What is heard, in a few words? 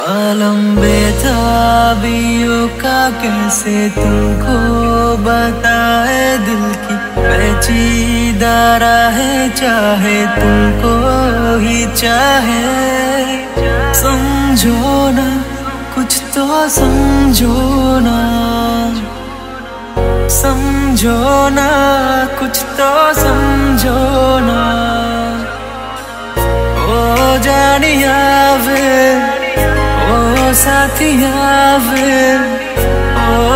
Hindi Song